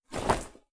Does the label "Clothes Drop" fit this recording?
Yes